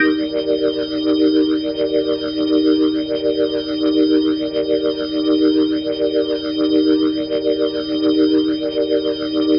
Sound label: Glowing Organ D# Maj7; Major 7; The Vitale Organ